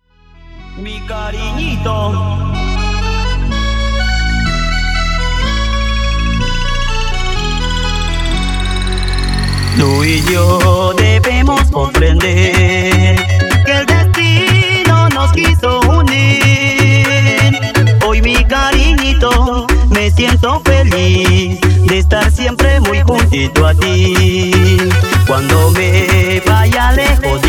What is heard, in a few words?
intro outro 124 bpm